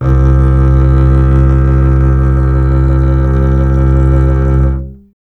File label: STR CBS, Solo Instruments